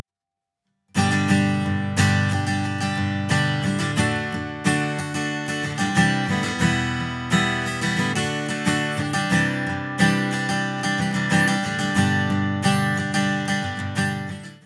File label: Acoustic Gtr SSL